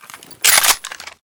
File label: ak74u misfire; STALKER; IWP